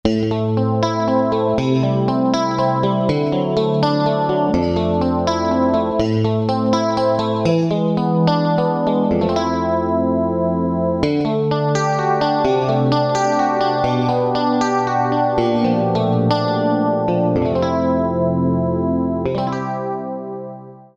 COOL ARP., Roland SC-880; Factory Patches

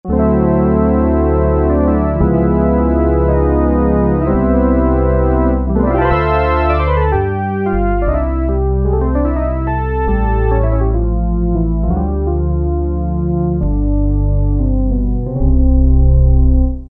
Organ Bell, Roland Juno-106; Factory Preset

